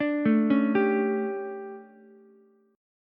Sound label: D Major 7th Suspended 4th, Ukulele; DM7sus4; arpeggiated; JGuitar